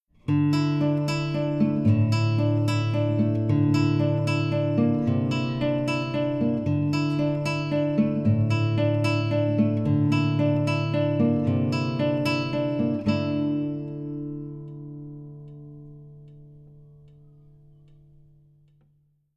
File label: Right Hand, guitar